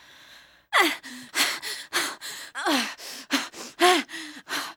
female out of breath; Zomboid 41.78.16; Project Zomboid

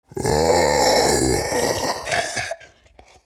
Male Zombie; Zomboid 41.78.16; Project Zomboid

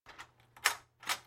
lock Door; Zomboid 41.78.16; Project Zomboid

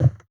Wood Foot; Zomboid 41.78.16; Project Zomboid

